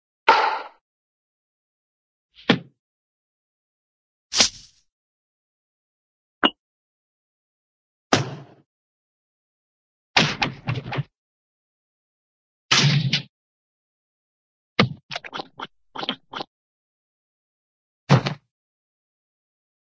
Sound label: hit, cartoon baseball hit